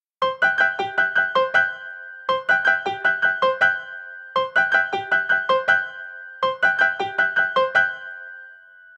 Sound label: Piano Urgent; Asus Zenfone-3-Deluxe; ringtones